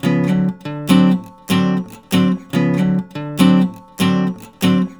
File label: RIFFS, Blues Guitar; ILIO; Fretworks